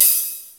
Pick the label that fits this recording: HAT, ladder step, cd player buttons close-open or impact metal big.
HAT